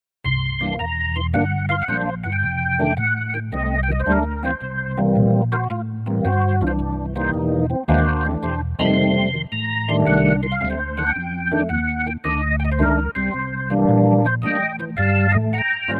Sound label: Jazz 2nd Perc plus C3, organ; montage